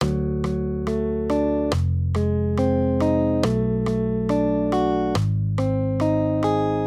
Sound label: maj triads; D-Ab; accompaniment